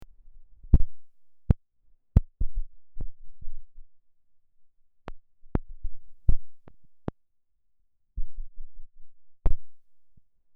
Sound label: NOISE test M147